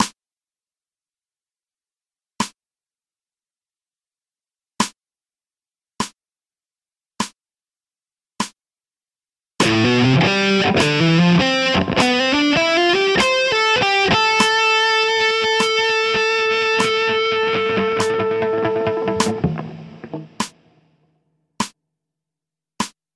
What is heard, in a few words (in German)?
Lick slow E-Gitarre; E-Gitarre Training; Fingerferigkeit